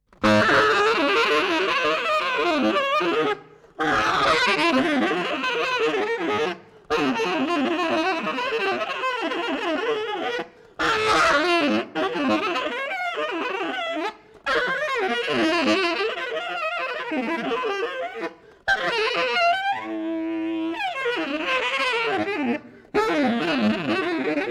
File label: tenor sax